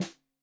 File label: Snare 65 Reg Stereo, Deeply Sampled Drums